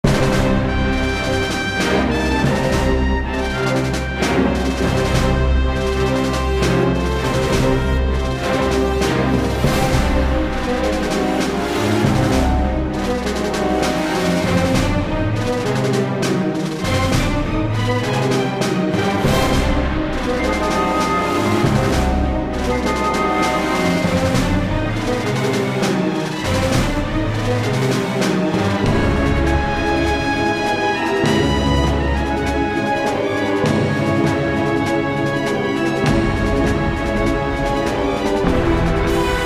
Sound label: battle; starfleetcommand3; fed